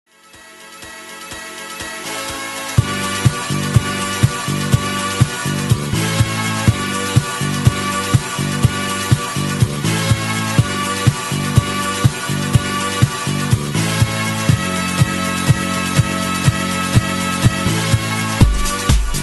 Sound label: INSTRUMENTAL